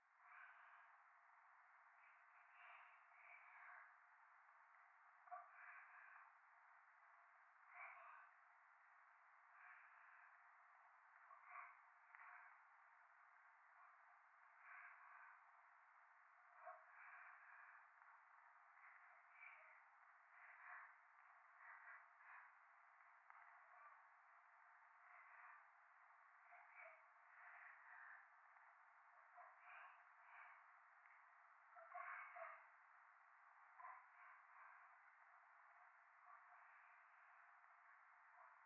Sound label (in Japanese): 左耳 密着 処女 喘ぎ 雨; 夢の中